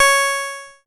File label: C#, PIANO; Big Fish Audio; Synclav FM